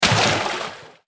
footstep water heavy

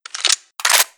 reload mg

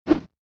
punch deep

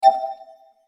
bling signal

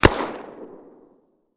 smg shot deep

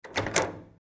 door open close

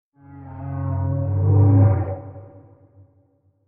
roar triceratops

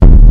footstep dinosaur

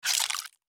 egg hatch

